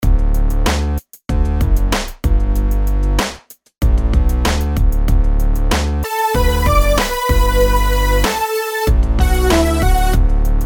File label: rhythms simple 8s major